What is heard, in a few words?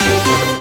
win Line; mega joker